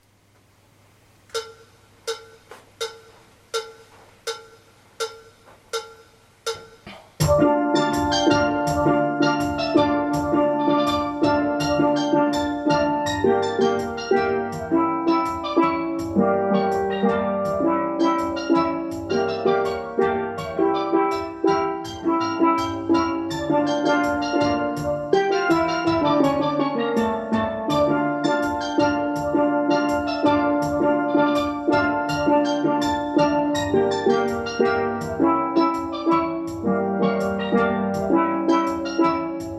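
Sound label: ALTO Intros + Refrains, Steel Band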